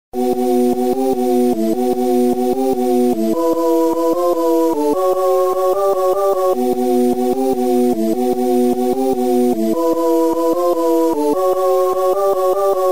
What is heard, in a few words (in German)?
Schiffsuntergang; 1869 - Hart am Wind - Erlebte Geschichte I; Amiga Version; musik